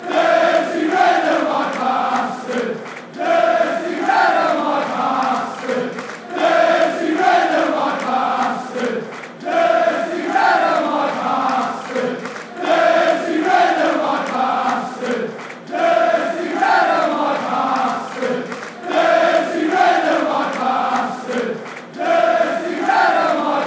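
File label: fanchants, football chants; dirty red and white; Hark Now Hear the Wednesday Sing Chant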